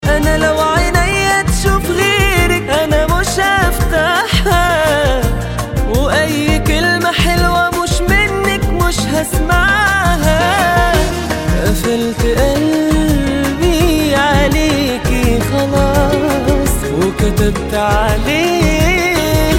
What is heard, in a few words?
Arabic